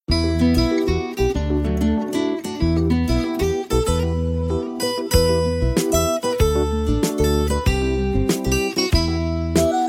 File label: message tone new ringtone